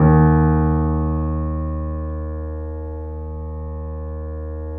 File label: D#, The Piano; InVision Interactive - The Piano; BOESINGSOFT